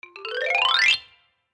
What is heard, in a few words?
Xylophone Run Slide; Mallets; Blastwave